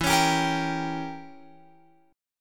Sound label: E Diminished 7th E, Guitar Open F; Edim7; strummed